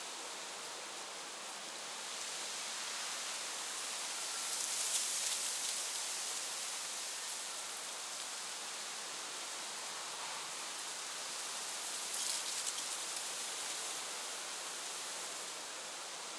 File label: Wind Leaves; Boomerang Fu